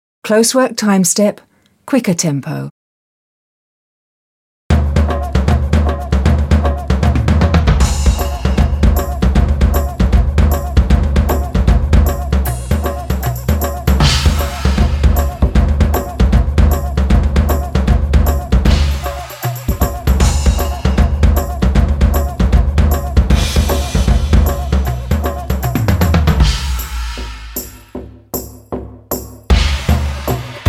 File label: Closework Timestep - Quicker